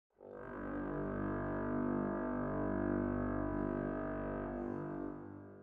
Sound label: Dramatic Brass